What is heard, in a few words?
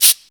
127 DRM, Fresh Disco House